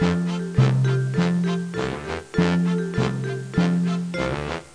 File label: search music